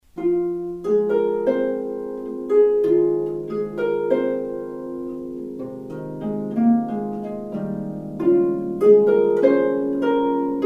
Reminiscences for Harp

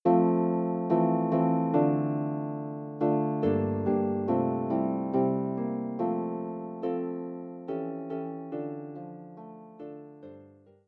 Harp

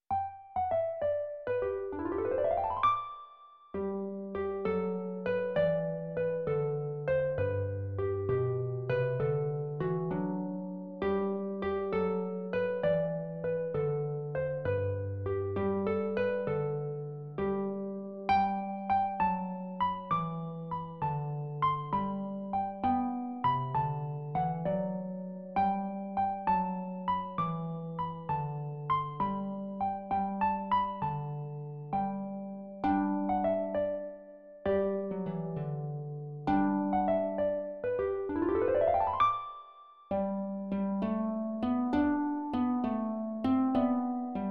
Carols for Solo Harp

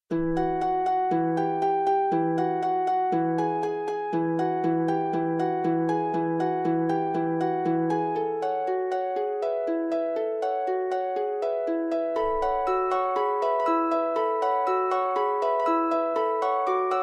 Harp, Sonatina; Harp Music from Argentina; Sonatina III

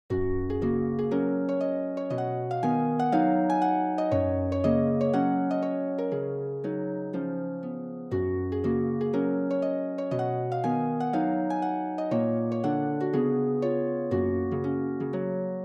Famous Music for the Harp

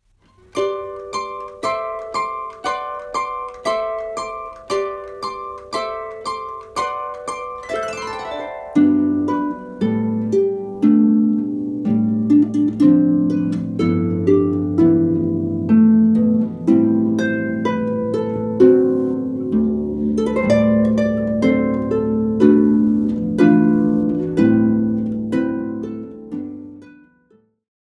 Jingle Bells

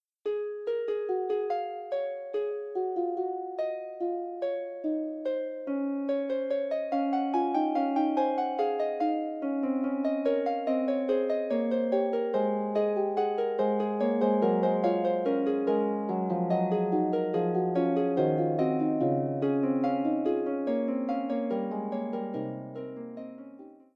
Fugue, Harp; Forty Eight Preludes and Fugues Book 1

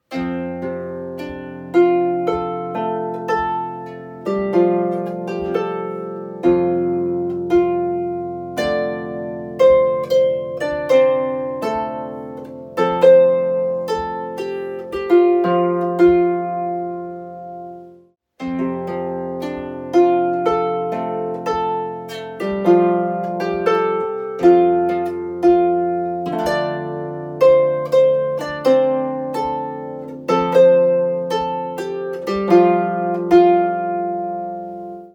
Gaelic Airs, harp; 4 Gaelic Airs

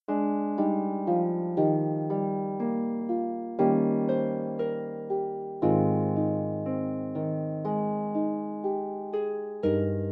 Irish Traditional Tunes londonderry air, harp

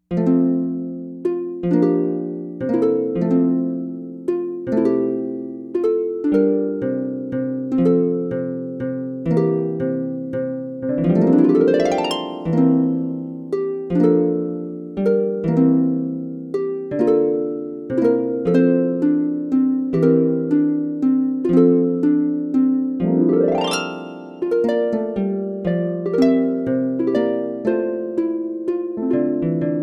Waltz, harp; Merry Widow

